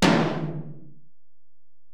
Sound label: Tom, Alesis DM-PRO, Drum Machines, Toms